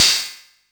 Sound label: Bass Crash, Jomox Airbase99, Drum Machines